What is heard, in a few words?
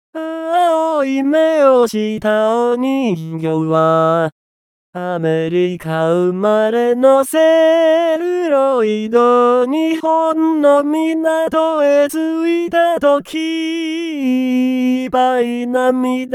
vocal only